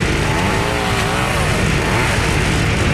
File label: Chainsaw Start; friday the 13th killer puzzle